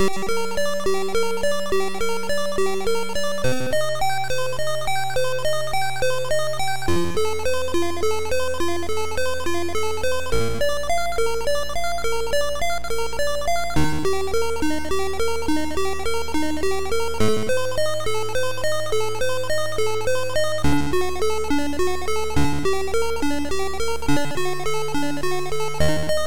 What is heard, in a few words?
PIANO; Wild Slyam